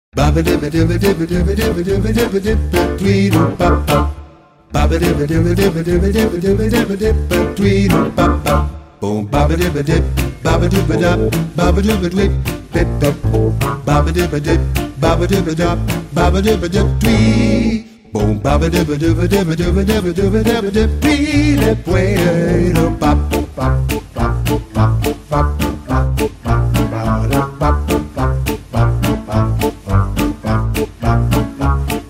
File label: Vocal Lead